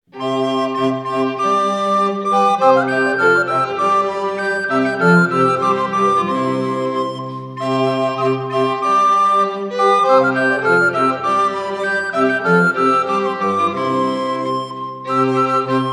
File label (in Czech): Renesanční tanec; Schola; Benefice pro Workshop 2000